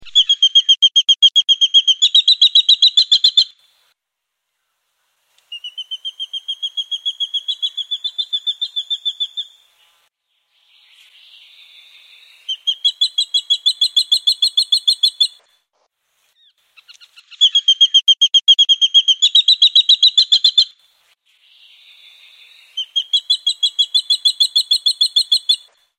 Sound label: goshawk brown, bird calls